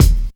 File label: original bass drum single shot d sharp key; kick